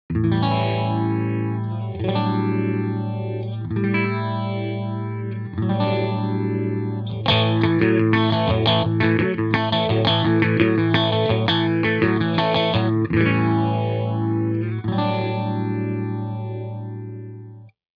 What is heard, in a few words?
PT999 Phase Tone